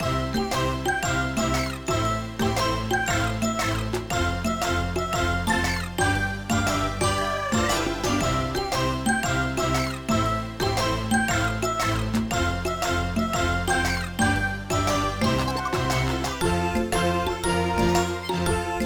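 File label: background music lobby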